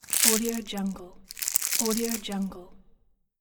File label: cello wrap crumples; nbkstudio